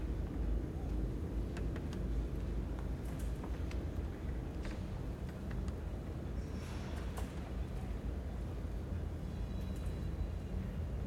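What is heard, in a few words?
ambience archive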